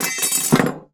Sawblade Drop; Double Trouble Audio; Tools and Wood

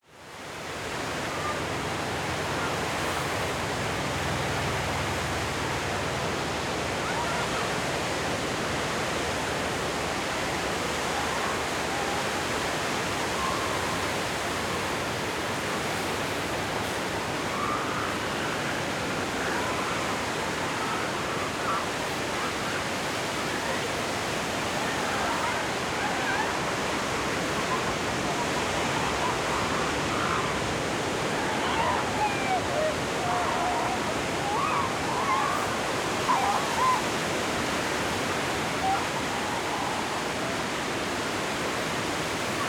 California Beaches, San Simeon, Elephant Seals; RDGSFX006

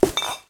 drop trashbag with bottles; 3maze

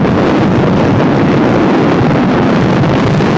wind blast; hl2; combine gunship

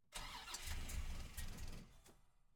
1967 Corvette OnBoard ENGINE, False Start; OnBoard-Group B; ENGINE MIC; GoldSND; Bryan Gold; 1967 Corvette Convertible Work-Up

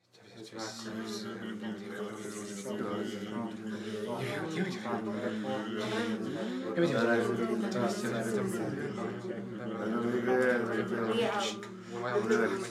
Human Speaking In Tongues Small Group; Soundopolis; Halloween 101

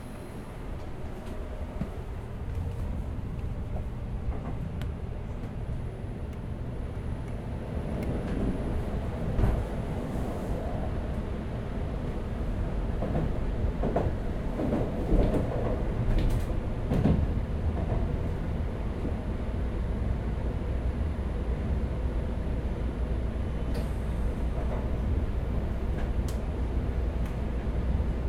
BULLET Onboard Stop Doors Depart, Japan Train; Prismo Sound; Japan Train and Platform

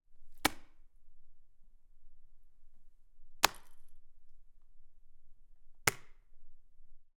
Meat drops, Deer; Coll Anderson

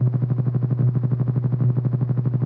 hold loop, physcannon; weapons

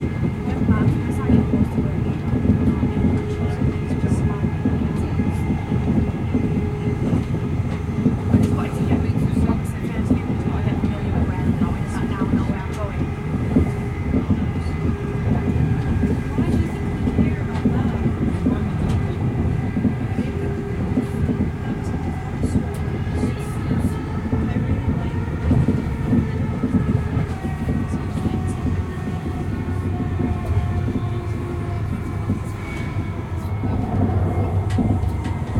Train Interior, UK Train Interior Ambience; InMotionAudio

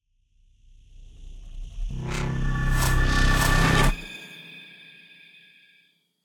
Digital Riser, Horror Jumpscares; Justsoundeffects

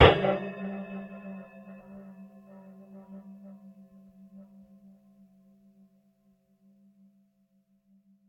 Clock Tick; InMotionAudio; Sinister Textures 3

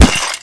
foot, cremator; hl2

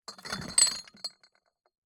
Bottle, Beer, Empty, Remove, Case, Sloppy; Kitchen

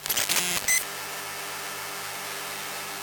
Electromagnetic Field Cellphone Loop; Glitchedtones

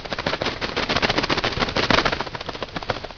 flap, crow; hl2

